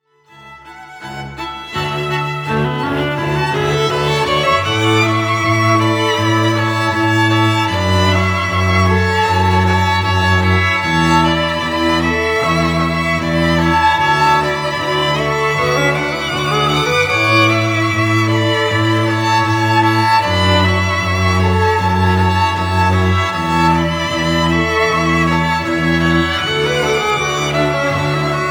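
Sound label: strings, String Quartet, Trio or Duo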